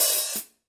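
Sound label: signature 12 combo crisp hi-hat open, cymbales; Forgées à partir d’un alliage secret de bronze; paiste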